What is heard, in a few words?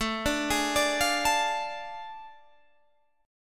A Major 7th Suspended 4th Sharp 5th; arpeggiated